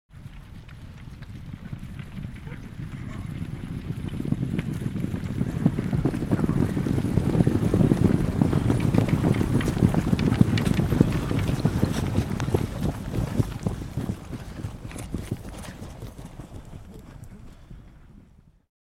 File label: Infantry Charge Feet Only; Historical; Hollywood Edge Historical Series; War Horses Armies & Weapons